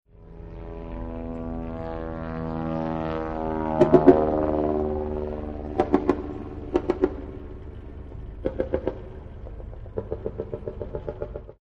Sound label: WW1 Biplane Tailgunner, Battlefield; Field Fire; War